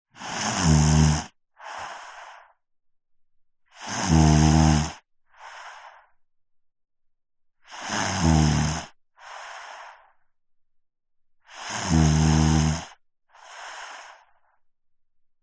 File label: human child boy 5 years snoring close up